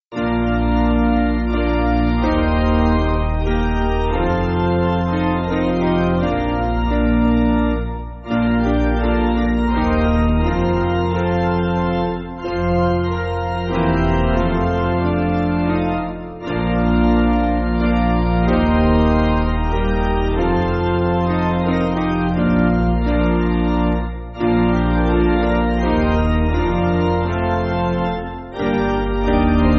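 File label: Piano, Backing Files for Churches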